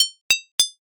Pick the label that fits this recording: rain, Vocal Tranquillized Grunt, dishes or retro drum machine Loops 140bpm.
dishes